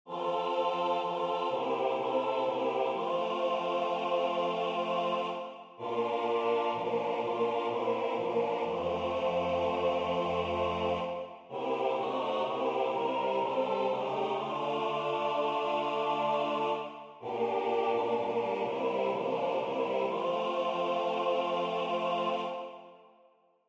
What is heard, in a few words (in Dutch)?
Lijze rijselt die snee SATB